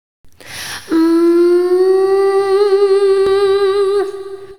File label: MMMMH, Vocal